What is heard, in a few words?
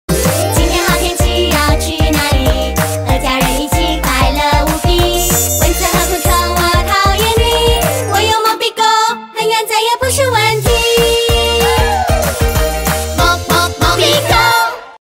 English & BM Jingle, Style: Expressive and teen; Mom&Baby Expo